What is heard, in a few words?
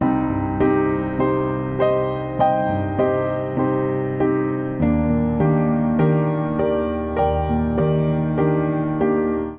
Progression Romantic i-IIImaj7-iv7-VImaj7 C Minor 100 bpm, Upright Piano; MIDI Clips; Ableton; Factory Packs; Spitfire Audio